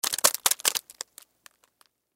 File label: Splat Hit Juicy, Splat Hit Juicy Impact Multiple; Splats; Foley; Objects; Blastwave